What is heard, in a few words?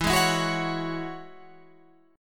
E 6th Add 9th E, Guitar Standard; strummed; chord; 12,11,14,11,14,14